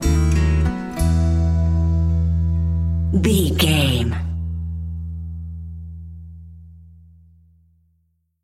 just feeling good sting, Pop Country, Country, Rock, Country Rock, Bluegrass, Folk, Blues, Roots, Happy, Uplifting; powerful, intense, driving